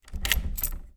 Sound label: household keys remove from wooden door